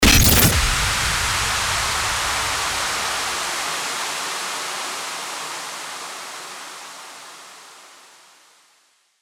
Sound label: STARTER WHOOSH